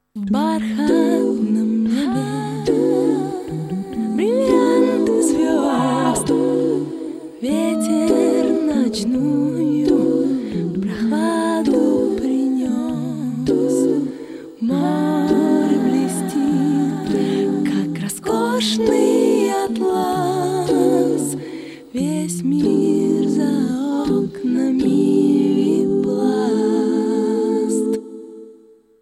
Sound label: blues